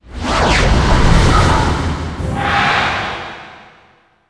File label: bee death, monster